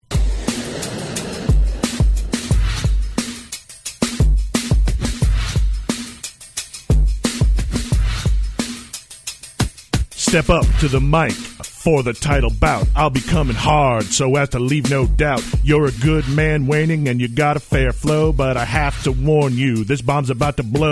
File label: Rap Round; 2015 Downtown Throwdown rap battle; Round 3